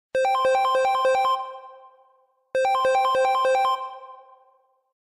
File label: samsung galaxy s10 space bell